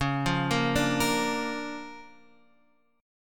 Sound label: D Minor Major 7th Sharp 5th, Db, guitar